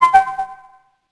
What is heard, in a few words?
tip beep; Max Payne